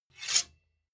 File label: sword scrape